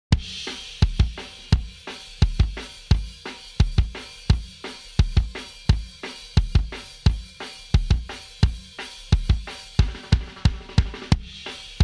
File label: kick processed